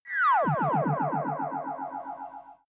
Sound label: alarming signal